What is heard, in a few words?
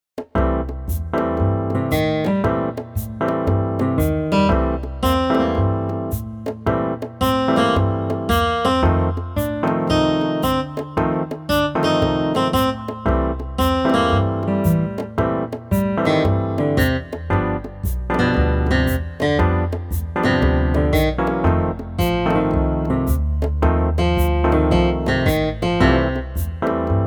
Midi Blues; spellman studio